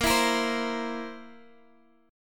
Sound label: A sharp Suspended 2nd Flat 5th A sharp, Guitar Half Step Down